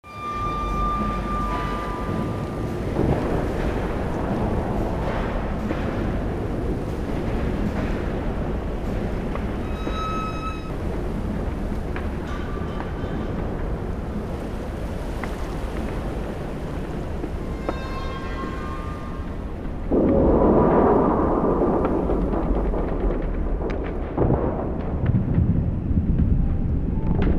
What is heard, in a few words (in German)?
Amb Bahnhof; mohaa